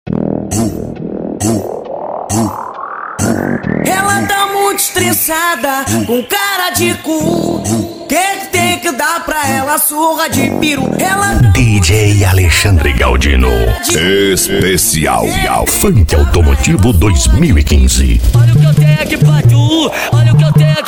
funk altomotivo; ap sport car som e insufilme

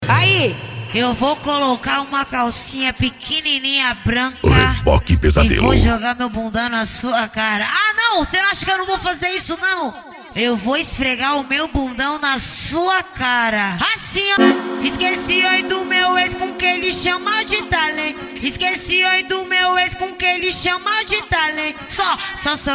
sound car

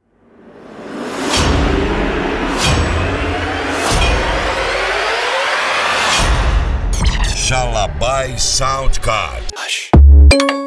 sound car mala aberta e mega funk

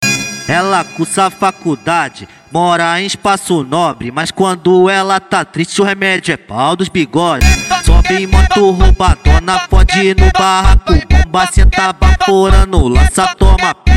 funk ritmado

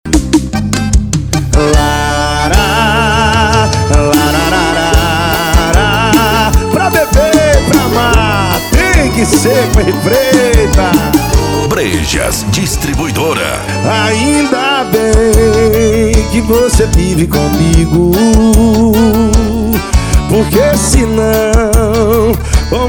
esp sertanejo; brejas distribuidora

